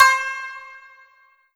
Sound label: Ensoniq ZR-76 Shamisen C5